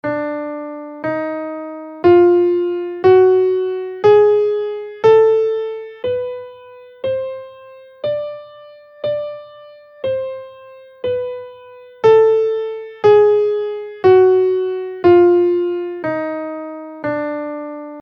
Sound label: half whole diminished scale in D